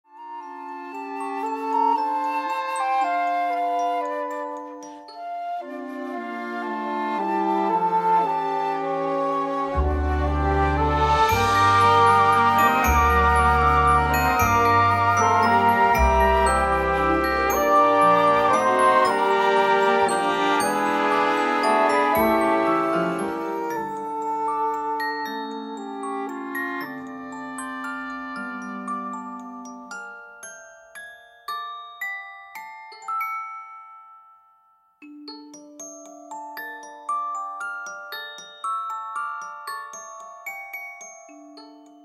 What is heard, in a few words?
Audition Accomp